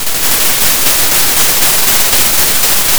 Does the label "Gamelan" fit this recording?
No